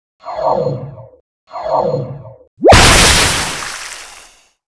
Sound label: King Slime Skill; Seer; GamigoNA